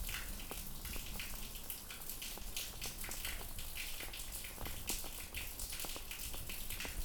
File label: rain sewer; 99Sounds; Rain And Thunder